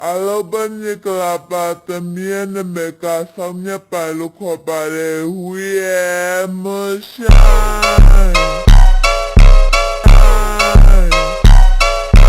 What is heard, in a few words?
real moon shine funk ultra slowed